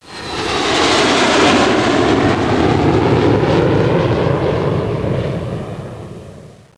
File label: jet; PaperPlanes